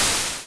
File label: Crush